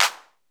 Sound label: 808 CLAP, Electro Samples; Central Processing Unit